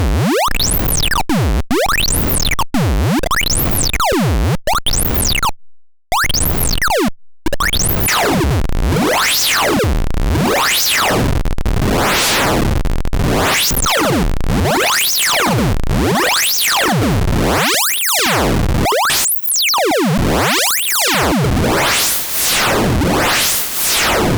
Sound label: pokey first sweeps